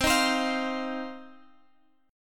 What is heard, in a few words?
C Minor Double Flat 5th, Guitar, Open G; down; chord shape